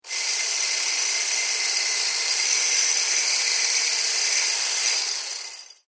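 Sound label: electric whisk; The sound of a Wizard Pro electrical whisk operating for a short burst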